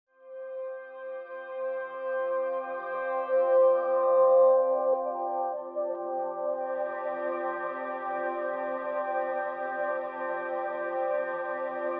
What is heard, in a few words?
ambient